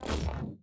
reload energy, weapons; cstrike; valorant; singurality phantom

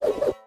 equip draw; cstrike; valorant; neofrontier sheriff

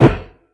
mag in start, weapons; cstrike; valorant; reaver vandal

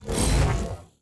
reload energy, weapons; cstrike; valorant; singurality phantom

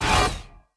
equip eerie; cstrike; valorant; reaver vandal

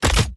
reload, weapons; valorant

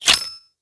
reload shells out; Valorant; sherrif

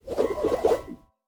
twirl; cstrike; valorant; neofrontier sheriff

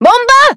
bomb throw; Valorant; raze

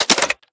mag out; cstrike; valorant; rgx 11z pro vandal

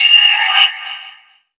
wolf bite confirmed; Valorant; cstrike; fade

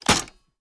Reload Top Open End; Valorant; odin

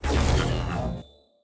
reload energy, weapons; cstrike; valorant; singurality phantom

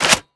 chg hnd fwd; valorant; araxysoperator

